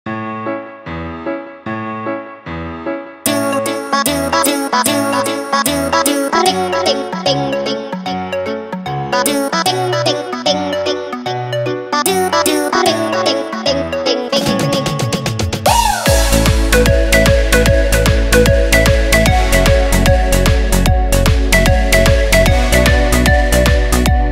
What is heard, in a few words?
Funny Song